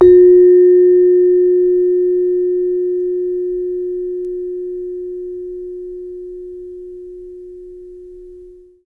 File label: SARON F 2; Spectrasonics; Heart of Asia